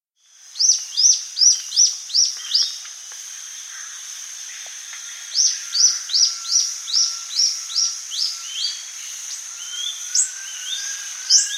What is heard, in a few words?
Rufous-Tailed Antwren, S. America